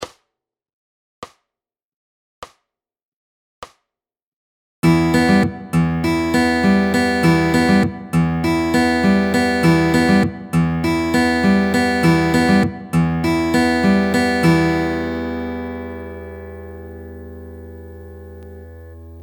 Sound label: Fingerpicking Pattern E minor; Fingerpicking Fun